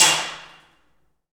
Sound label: PRC ALMWA, Aluminum Wall